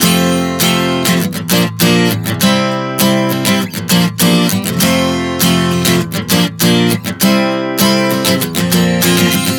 Prog 100 F Bb C Bbsus9, Acoustic Guitar, Chord Progressions 100 bpm